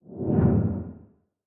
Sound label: Tentacle Reach; Silence Incarnate